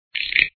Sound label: stretch